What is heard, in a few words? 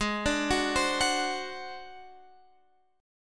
G sharp 7th Flat 5th G sharp, down